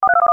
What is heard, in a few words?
DTMF RX; Zello Android Gateway